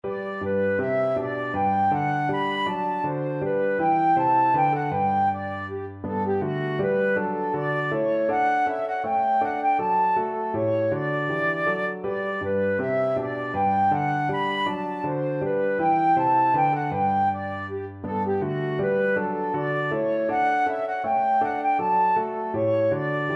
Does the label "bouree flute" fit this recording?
Yes